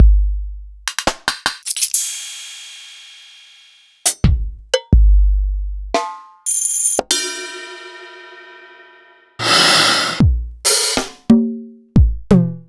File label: EMU Orbit